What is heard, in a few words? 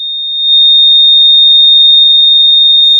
Sound of a tea kettle whistling